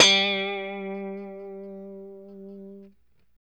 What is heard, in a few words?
POP G 3, STRAT guitar; Spectrasonics; Hans Zimmer Guitars VOL-1; JC-STRAT POP